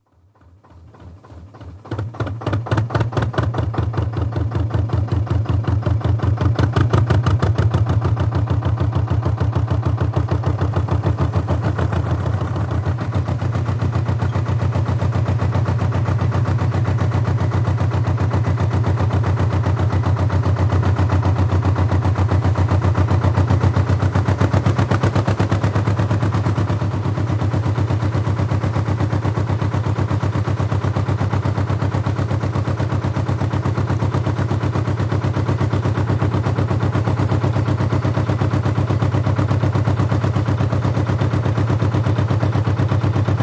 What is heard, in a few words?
Canal Narrow Boat, trip with changing acoustic as boat passes under bridges, etc (Recorded on board); Boats; BBC